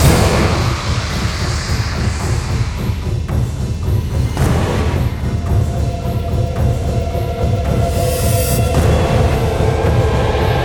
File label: Combat Horror